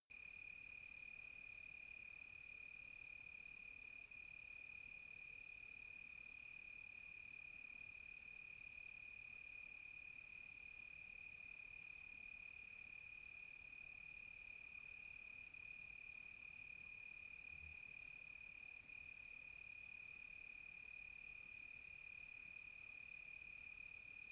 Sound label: quiet tropical night close crickets